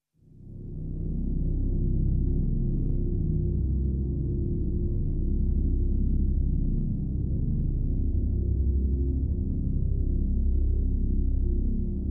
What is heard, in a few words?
Drones Only